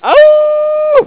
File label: awooo